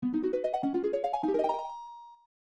Melody Harp; Dai Kaiju Monogatari; SNES

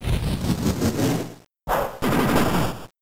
Focus Punch; Pokemon; Gen 3; attack moves; RSE

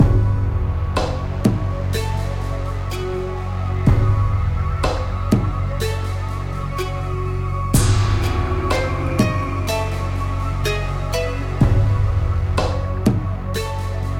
Pause Menu

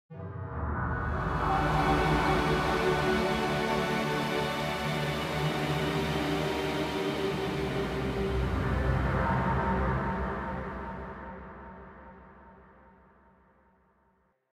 Danger Tonal; Insanely Twisted Shadow Planet

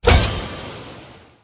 Click

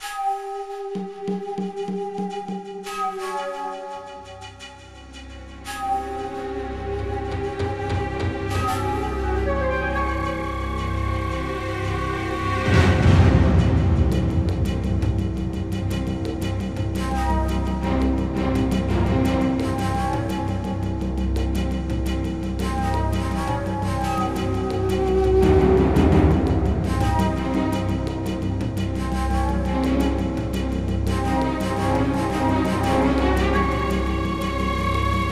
Battle Music; Platoon

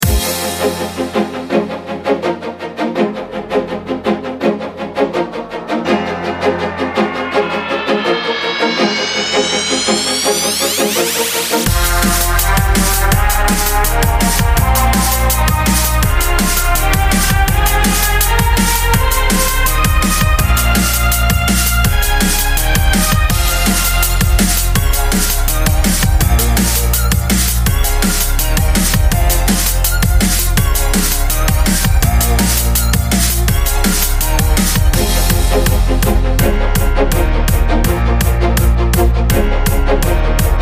music wormhole to somewhere; run 3

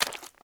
Foot Mud Shoes Walk

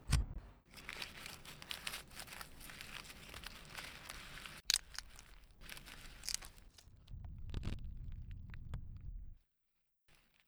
paper rustle

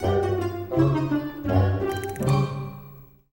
sting motive failure; The Sims 3; Windows

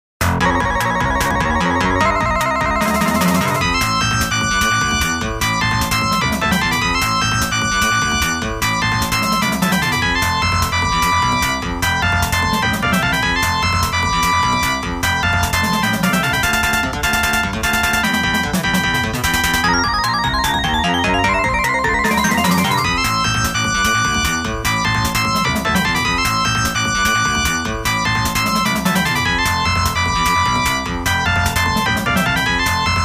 Battle

